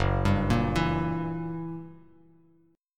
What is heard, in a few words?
Gb 7th Flat 9th Gb, Bass Standard; arpeggiated; JGuitar